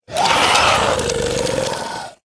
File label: Bogstrok Clacker Attack